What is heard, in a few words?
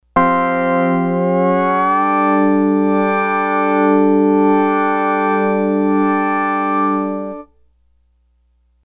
Level Up